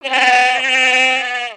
Pet Goat Howl; creatures pets

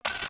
Broom Bot Sound, creatures mechanical

